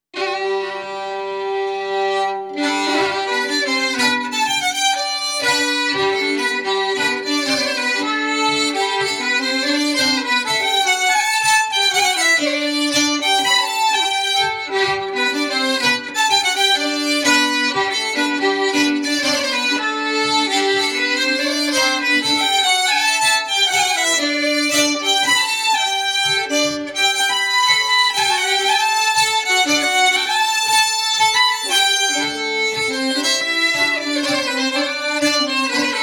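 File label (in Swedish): Polska, säckpipa; efter Ernst Pettersson Brattfors; Fina låten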